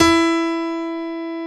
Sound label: d#4-f#4, piano, grand; Giga Samples Collection; ARS Grand